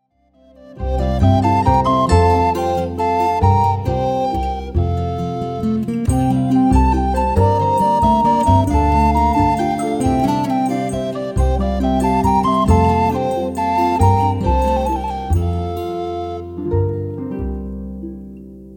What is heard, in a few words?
Minuet, Duette; Nette Duette